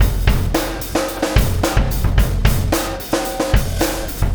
Wormhole Beat, Processed Drumkits

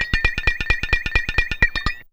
Stir, Fairlight CMI IIe

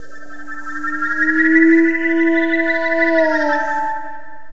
SYN, Drum & Bass 124 BPM; Ueberschall; Drum & Bass Flash Trackz

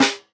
Snare, JUNGLE BREAKS

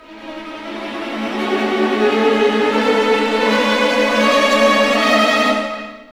OPEN; East West; Scoring Tools; ORC; Open Theme

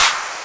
Claps; Vengeance; Essential Clubsounds Vol.4

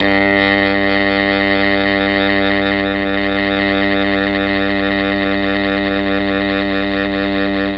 G1 MKII SAX, Mellotron; Mike Pinder - Mellotron Archive CD

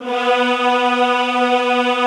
EHH B2, Classical Choir; Best Service

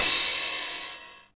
Rides, Cymbals

